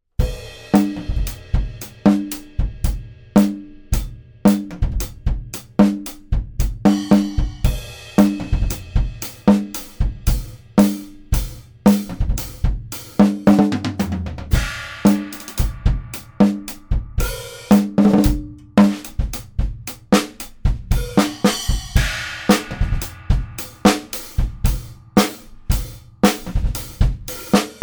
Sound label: DR-412 DRUMS